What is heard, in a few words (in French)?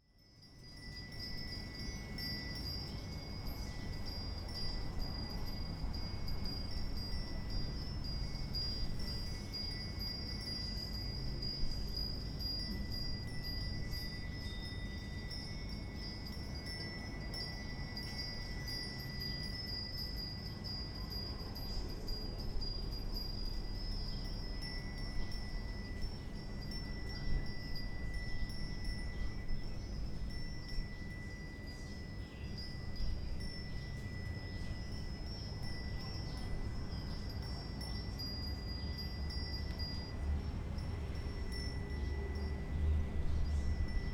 clochettes à chengmai